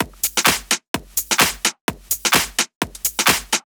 Loop, 128 BPM

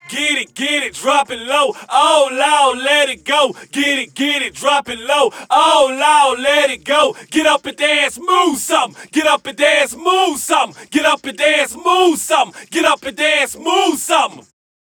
VOX

